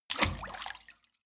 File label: step water run in